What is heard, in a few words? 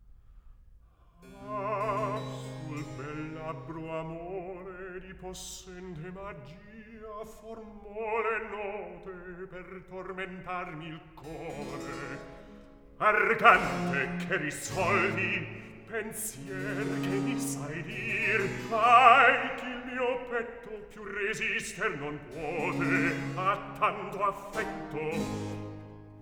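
Recitativo, Handel opera; Act 2; Ah! Sul bel labbro Amore; Handel - Rinaldo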